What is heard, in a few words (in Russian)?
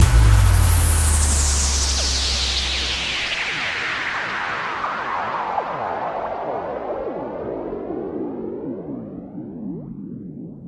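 цифровой звуковой эффект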